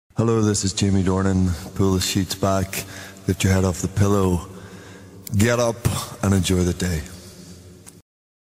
Acoustic

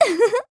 Giggle; Zelda

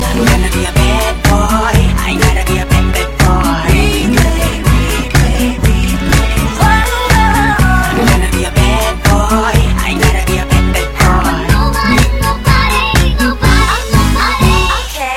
kpop; ios

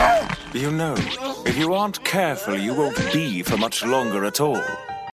Is Calling RNB

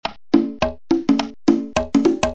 Hippie Bongo

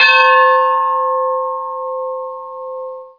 3d message tone; ringtones; sms

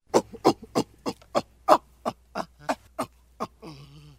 ape grunts; animals

